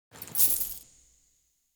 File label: Coin Pickup